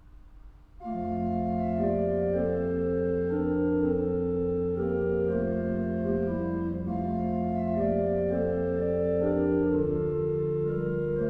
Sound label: F major, organs; Stanford